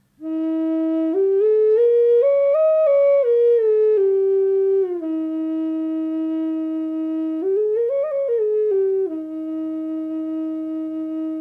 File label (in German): Native Drone Flute Indianerfloete Doppelfloete E440 ohne hall; aromatic cedar